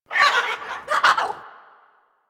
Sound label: Bird Of Prey Vocal Shake C; Warframe